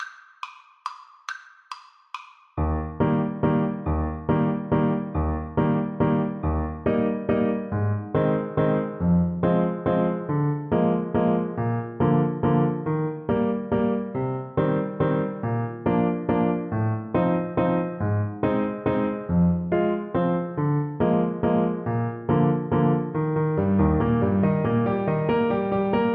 French Horn

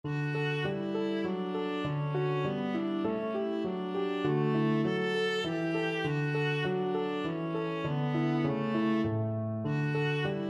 viola

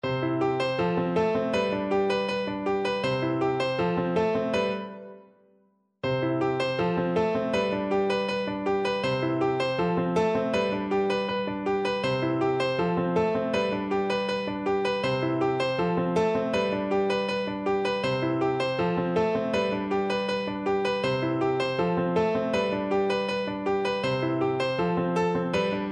Oboe

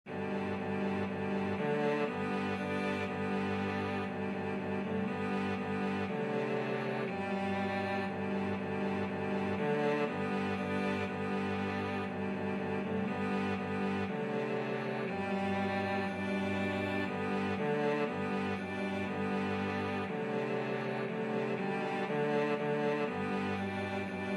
cello quartet

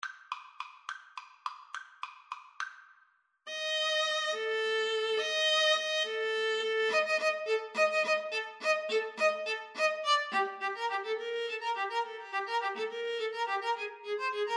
Viola Duet

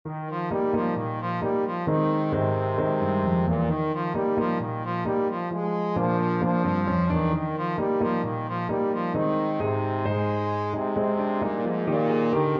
trombone

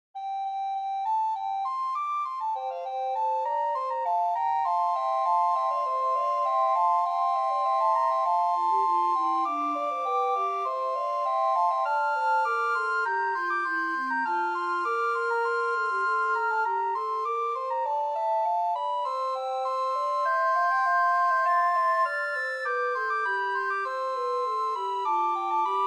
recorder; pachelbel gelobet

